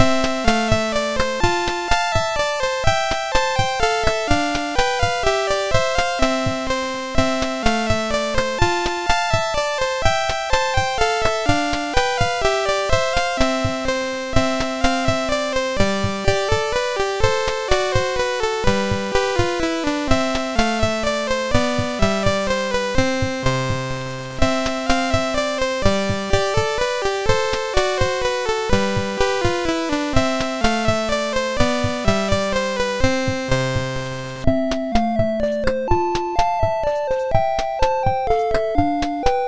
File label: Basic Gametune, MOS6581R2